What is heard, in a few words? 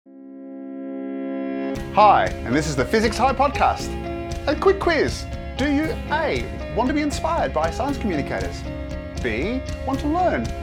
podcast intro audio; physicshigh